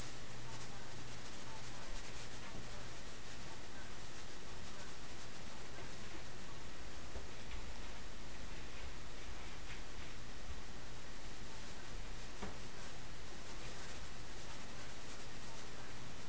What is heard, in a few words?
distance from the source; binaural tone and sky news modulation